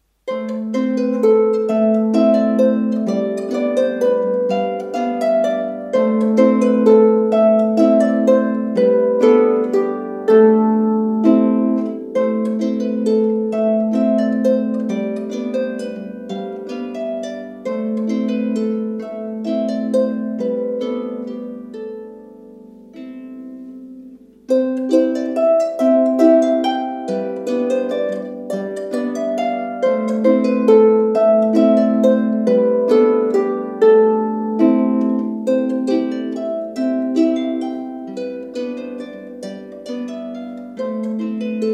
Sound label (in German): Walzer